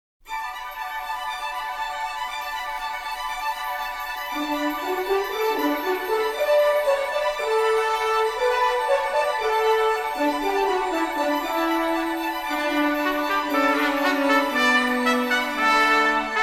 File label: Instrumental; Tree Lighting